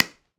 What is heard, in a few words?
Pickelball Paddle Hit Ground Medium, Ball Collision